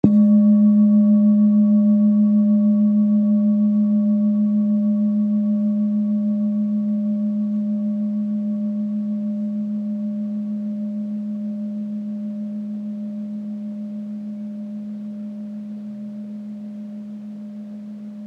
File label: bowl soft G#2 mf; healing soundscapes